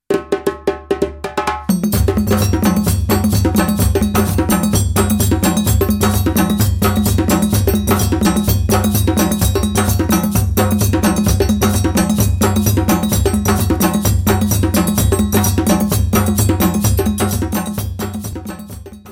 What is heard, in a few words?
makru whole rhythm; rhythms